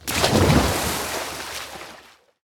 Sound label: Aquatic Dark Play Underlay